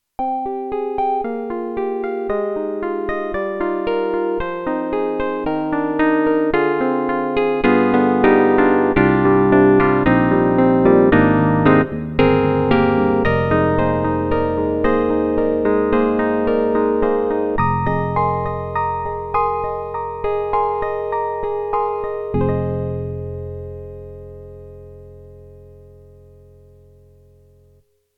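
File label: YAMAHA MU1000EX, 60s Electric Piano 1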